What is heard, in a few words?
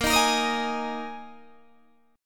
A sharp 7th Suspended 4th down Guitar DADGAD